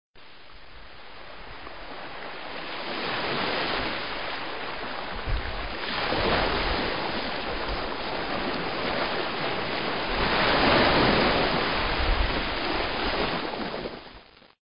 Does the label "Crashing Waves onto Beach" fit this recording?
Yes